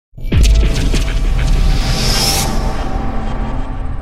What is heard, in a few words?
Drums